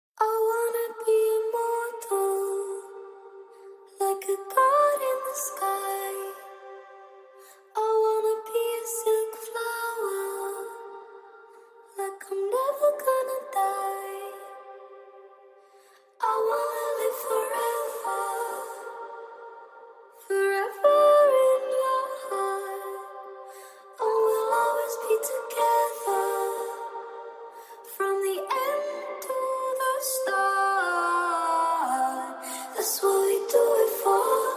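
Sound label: EDM 120 F minor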